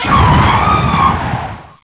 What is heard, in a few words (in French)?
Cri Totémique